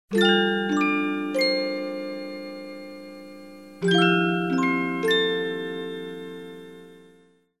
synthesized music; SOUND EFFECTS1

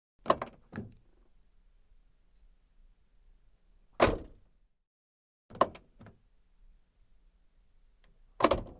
Car door open close gentle; Cars

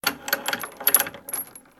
BIFOLD, Doors; 1000fx

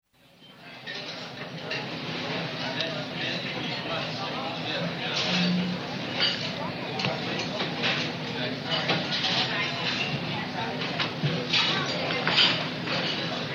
Cafeteria; SOUND EFFECTS1

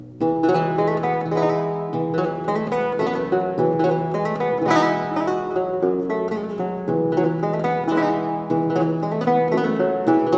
Horne-pipe, Lute; Jane Pickeringes Lute Book

